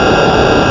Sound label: SportsCar; aerialcars